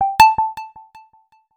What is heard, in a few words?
Twoosh, notifications; HTC One M9